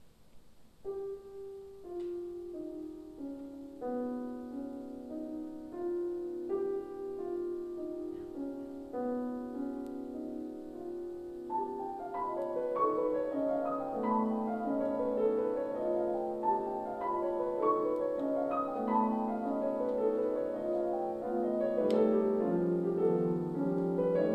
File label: Cloches; Debussy